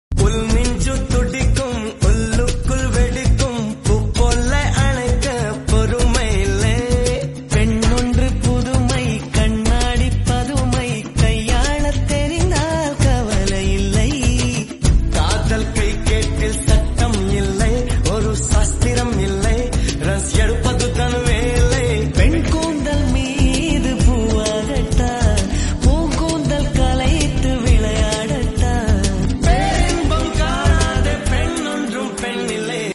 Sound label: tamil song 90s